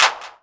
clap, Korg ER-1; 300 Drum Machines

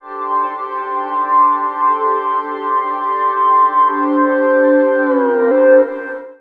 Filo Pastry G#, Pads; Total Drum&Bass; 90 s Sample CDs

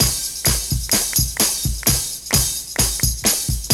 loop, Roland; Sound & Vision - Gigapack I

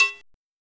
Oberheim DX

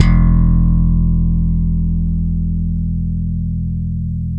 6 STR FUNK; Spectrasonics; Bass Legends

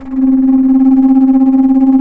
FLT, Bass Flute, Bs Flutter

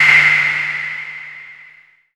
SPACE DRUM; Q Up Arts; Dream Experience; DRUMS PERC

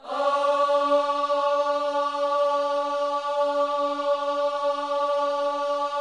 OHS D 4C, Gospel Ohs; Spectrasonics; Vocal Planet

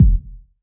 BD, BASS DRUMS; EdgeSounds

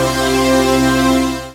SYN DANCE; Sound & Vision - Gigapack II

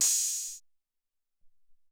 O Tek Hat, Alesis SR-18

